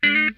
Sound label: Funk Guitar; RARE GEM; Zero G - Funk Guitar